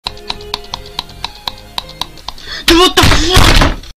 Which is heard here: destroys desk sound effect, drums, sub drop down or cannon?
destroys desk sound effect